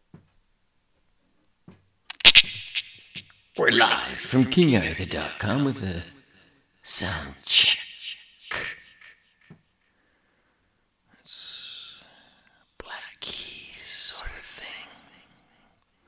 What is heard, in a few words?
Soundcheck